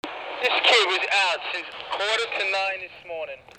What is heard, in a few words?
PHONES